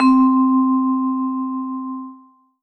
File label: Roland GR-1 Vibraphone C5